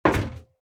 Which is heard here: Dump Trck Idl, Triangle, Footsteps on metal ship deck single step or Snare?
Footsteps on metal ship deck single step